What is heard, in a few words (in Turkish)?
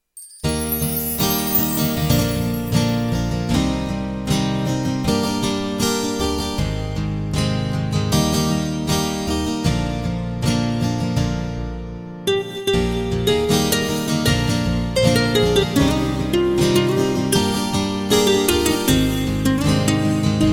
gw8 gitarli performans